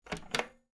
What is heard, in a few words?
switch